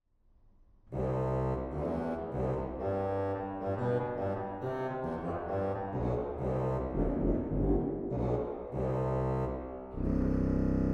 Posaune 16; goenn

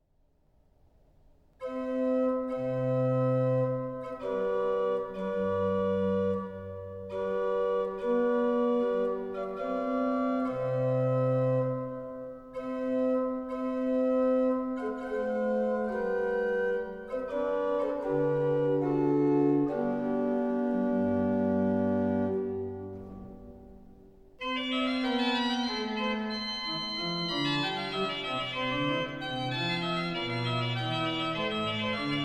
Cornet Voluntary; frechilla; Travers

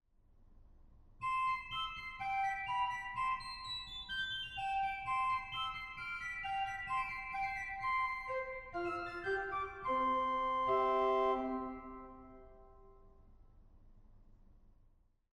Octav 2, organ; goenn

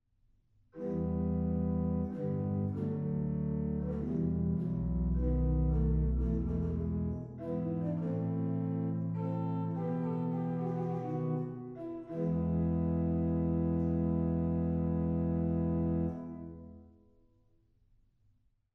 Principal 16, organ; stade

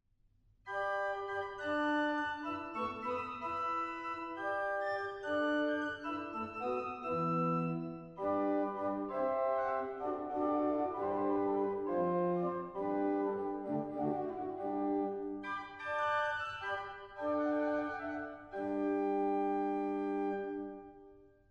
Octav 4; stade

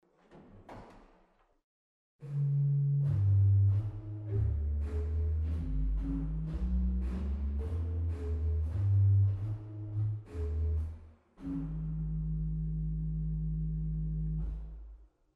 Sub Bass 16; waltershausen

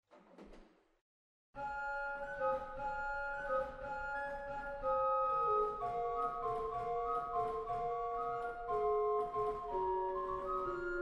Gedackt Qvinta 3, organ; waltershausen

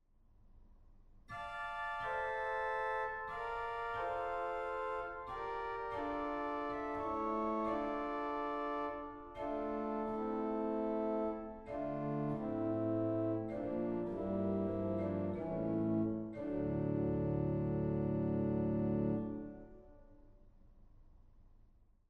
Salicional 8, organ; 1844 Franz Xaver Engelfried Organ; goenn

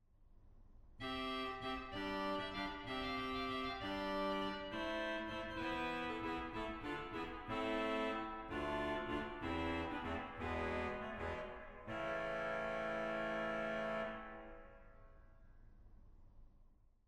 Clarinette 8, organ; 1844 Franz Xaver Engelfried Organ; goenn

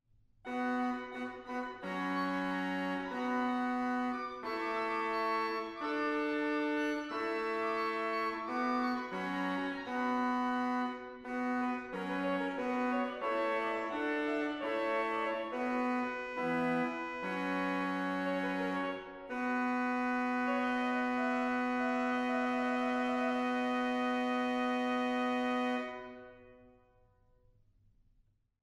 Schalmey, organ; stade

